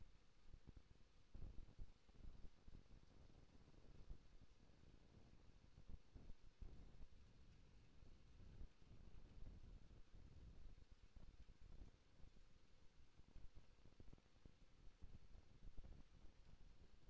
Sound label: HYDROPHONE 16000Hz; JASCO AMAR HYDROPHONE 740